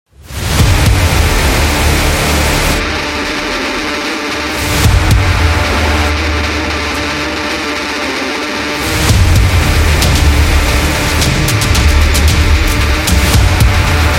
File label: Trailer Rock